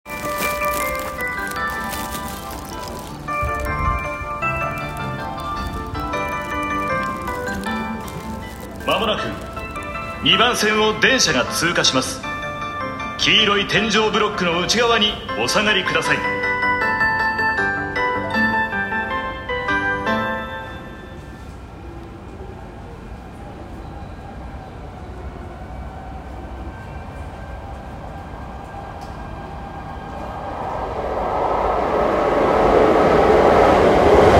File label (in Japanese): 京成四つ木通過予告